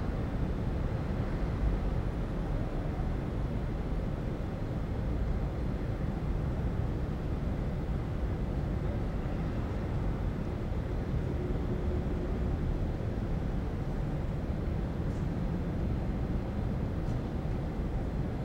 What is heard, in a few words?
City Skyline; zps; Ambient